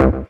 Bass C, Offbeat Bass

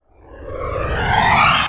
Short Fills & Reverse; Vengeance Samples

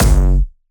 Jumpstyle Kick F#1; Bassdrums; Jumpstyle Multis; vengeance essential club sounds-3; VEC3 Bassdrums

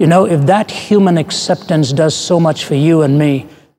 Vocal Phrases; Vengeance Samples; Vocals

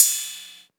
Ride; Vengeance Samples; VEE Cymbals

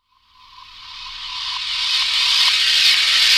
FX Uplifter

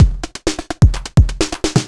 Housebeats 128BPM; Vengeance Samples

